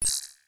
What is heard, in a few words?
menu edge; Original TK Sounds